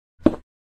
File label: mattock